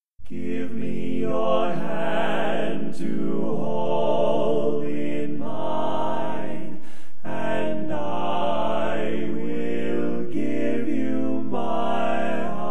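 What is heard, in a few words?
baritone, barbershop tag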